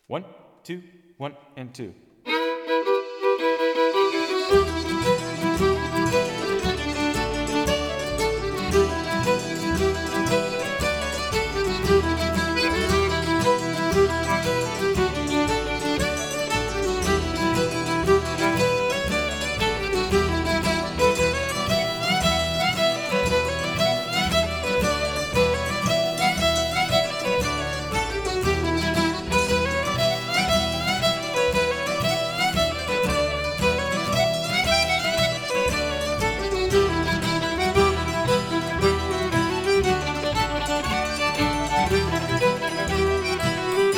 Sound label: swallowtail jig performance version